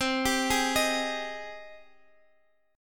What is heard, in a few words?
C Minor Add Flat 6th C